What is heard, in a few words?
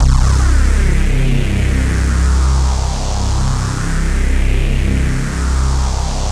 big ships C2; Best Service Dream Experience; VETRON X